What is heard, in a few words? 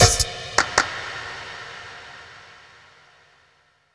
Perc - 5A; Jungle; FX; Blu Mar Ten - Jungle Jungle - 1989 to 1999 Samplepack